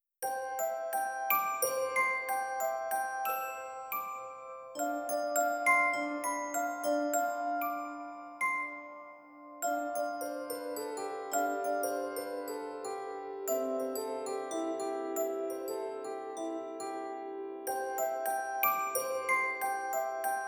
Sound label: MUSICAL BOX; Féerique